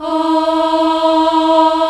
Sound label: FEMALE E3, CHO AH